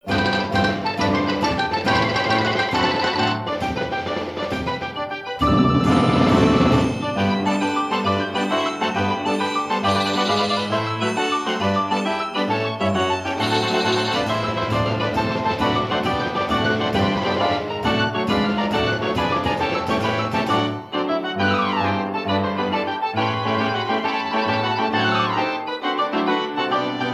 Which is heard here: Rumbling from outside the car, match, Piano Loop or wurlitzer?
wurlitzer